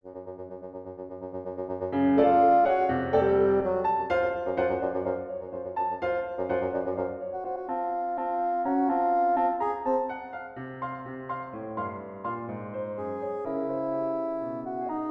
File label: Humoresque in F minor, for Bassoon and Piano; Roma